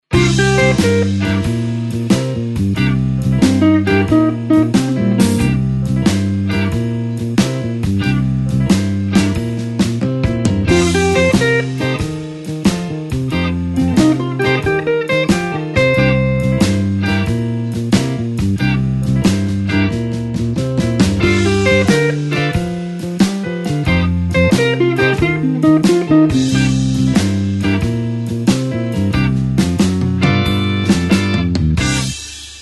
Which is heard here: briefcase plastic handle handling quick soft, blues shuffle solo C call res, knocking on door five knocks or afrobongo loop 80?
blues shuffle solo C call res